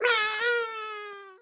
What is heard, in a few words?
baby crying